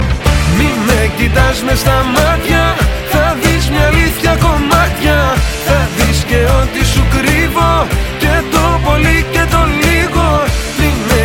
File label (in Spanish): saxo, reggae